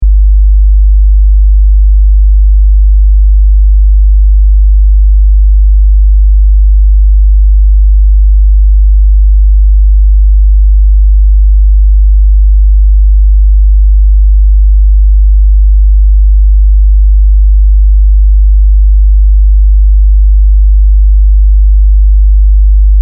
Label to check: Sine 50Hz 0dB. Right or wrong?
Right